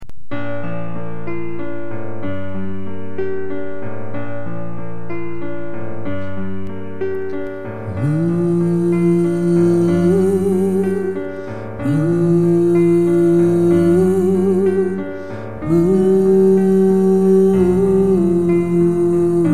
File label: Hallelujah Instrumental